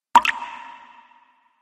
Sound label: waterdrop